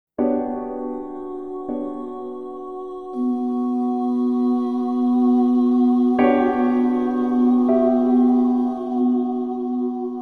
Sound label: Lifeguard Theme Piano; Foundry; FoundryVTT; sr5 Dawn Of The Artefact; Ambiance